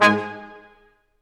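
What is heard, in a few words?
BRS Q.SHT, Roland, brass staccatos, quintet; Roland L-CD702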